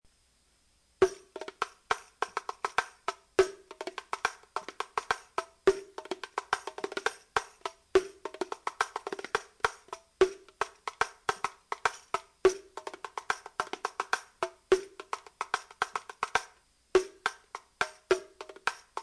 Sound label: riqq; drums; Vince Plays some of his favorite drums